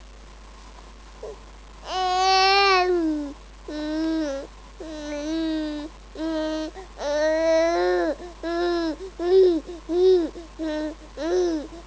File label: vocalise